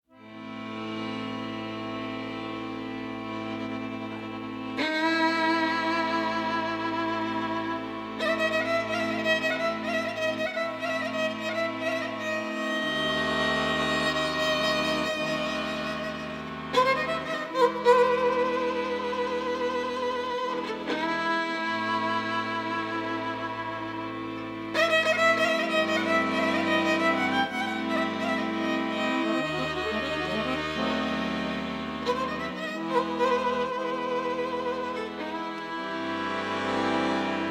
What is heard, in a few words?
Doina